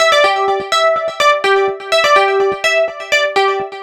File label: Synth